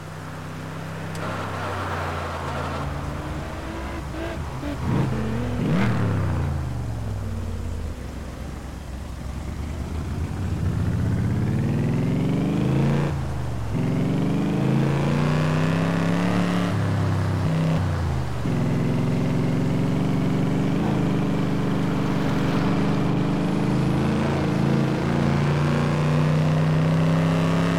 aston-martin db4 gt zagato; TDU-1

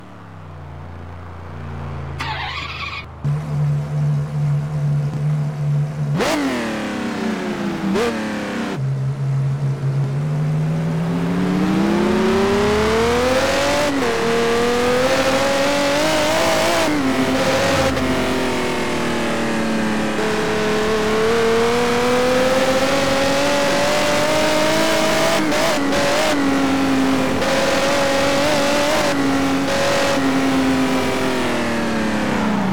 audi r10 tdi; le-mans; TDU-1

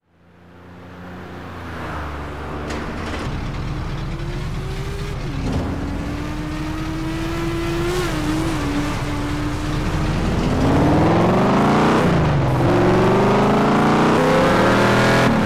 chevrolet camaro z28

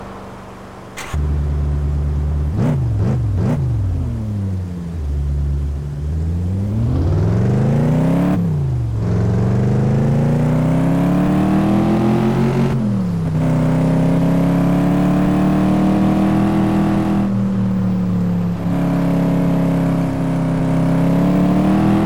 audi cs4 clubsport

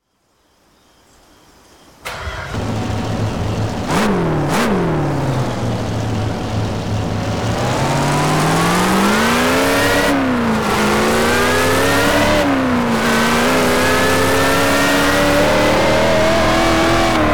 dodge 426 hemi dragster; TDU-1

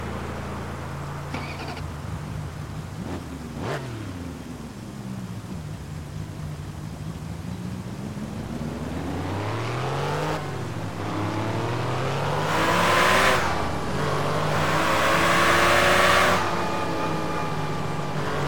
alfa romeo 8c competizione; TDU-1

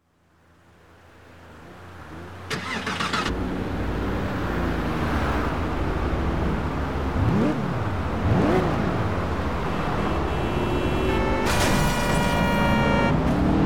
ferrari 612 scaglietti

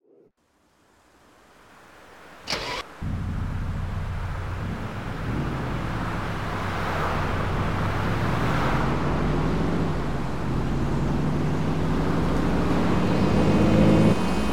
chrysler firepower

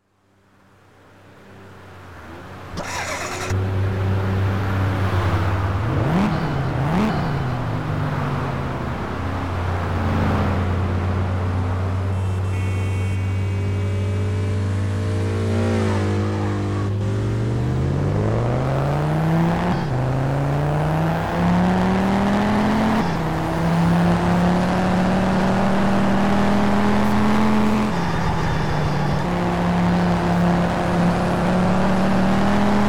ford focus rs; TDU-1

